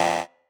marimba bike; ゆめ2っき

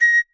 marimba child; ゆめ2っき

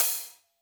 marimba drum; ゆめ2っき

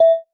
marimba glasses; ゆめ2っき

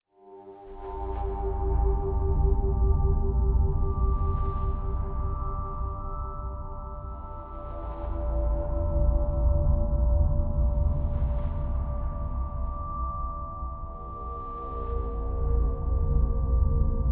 Cave; ゆめ2っき

